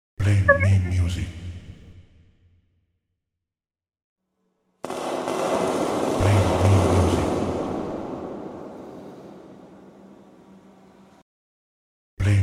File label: SFX WHOOSH